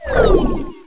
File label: Follow Ring Fail; Finding Nemo; XBOX